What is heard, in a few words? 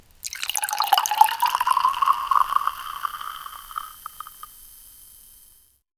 sparkling wine being pour